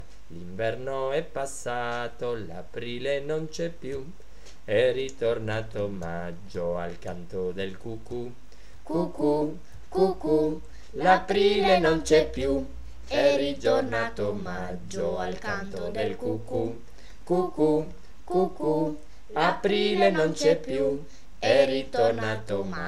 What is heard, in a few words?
cucu cucu